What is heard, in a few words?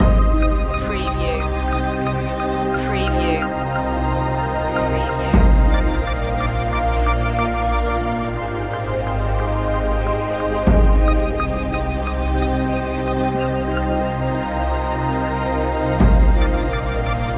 fantasy loop